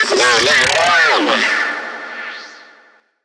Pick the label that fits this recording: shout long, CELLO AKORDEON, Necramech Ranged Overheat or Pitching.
Necramech Ranged Overheat